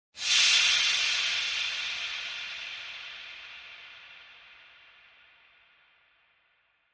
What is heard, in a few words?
Air Release Hiss Rev